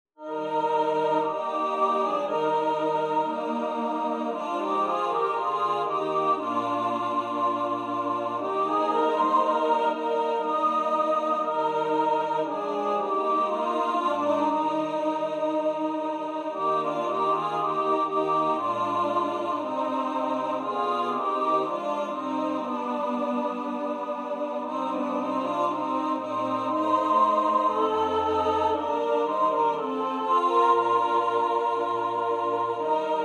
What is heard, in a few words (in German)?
Tochter Zion Sopran